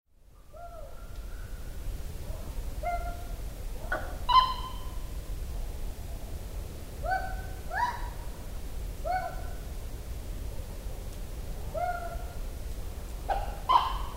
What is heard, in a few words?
coo calls